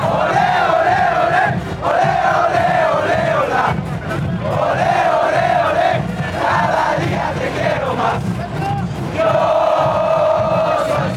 ole ole ola chant

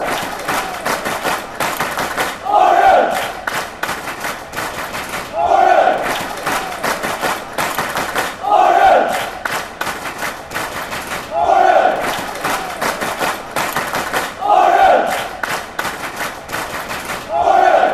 clap, chants; orient

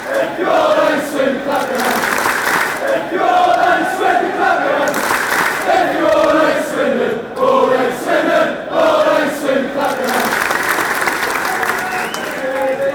if you all hate swindon clap yer hands, chants; oxford

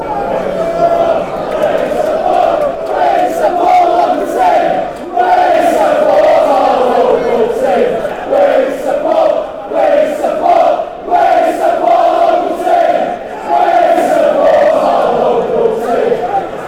we support our local team, fanchants; blackpool

